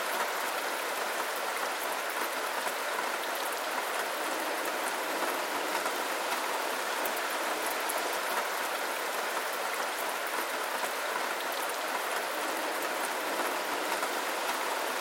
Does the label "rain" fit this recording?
Yes